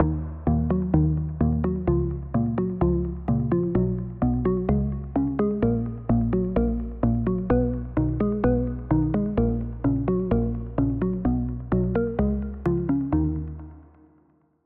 Pluck, Spire; Routed Sound; Spire Expansion - Star Scavenger Vol.1

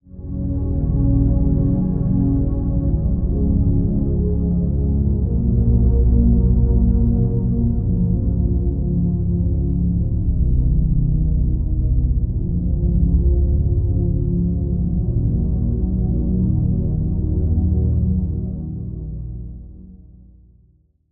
Pad, Sylenth1; Routed Sound; Sylenth1 Expansion - Star Scavenger Vol.1

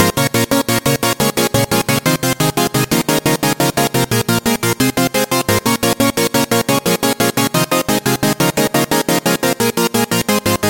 Chord; Routed Sound; Sylenth1 Expansion - Hyper Gamer Vol.1

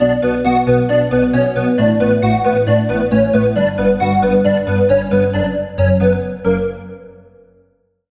Woodwind; Routed Sound; Sylenth1 Expansion - Story Walker Vol.1

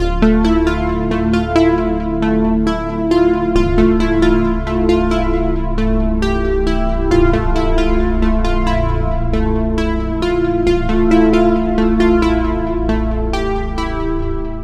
Key; Routed Sound; Spire Expansion - Reality Maker Vol.1

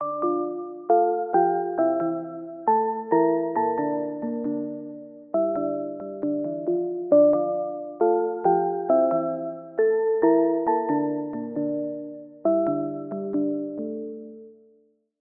Pluck; Routed Sound; Serum 2 Expansion - Street Vanquisher Vol.1

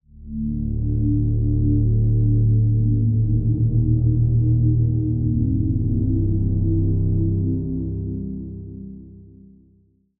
Pad, Sylenth1; Routed Sound; Sylenth1 Expansion - Star Scavenger Vol.1

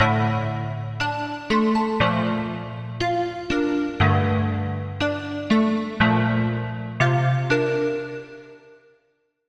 Key; Routed Sound; Serum 2 Expansion - Street Vanquisher Vol.1

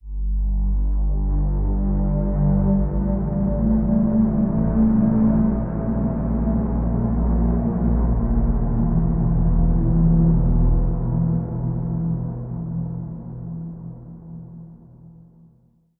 Pad, Spire; Entanglement; Routed Sound; Spire Expansion; Quantum Enforcer Vol.1

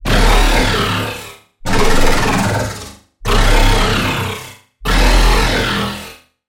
Growl - Bio Mech; Routed Sound; Vital Expansion - Chaos Bringer Vol.1

